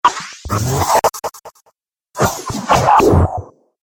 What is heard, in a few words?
Dubstep Scifi Effects